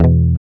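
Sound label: GUI E, acoustic guitar; Best Service Gigapack 2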